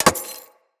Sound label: Bullet Impact Glass Large; Weapons